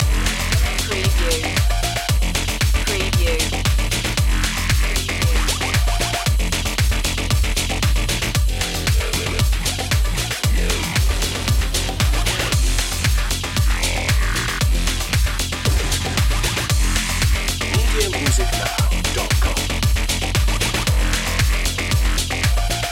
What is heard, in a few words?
disco stepping loop